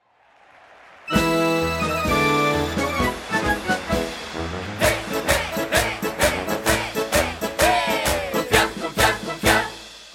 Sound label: jingle